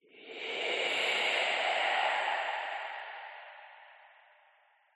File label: breath exhale throat slightly constricted ascend reverb eerie; skyclad sound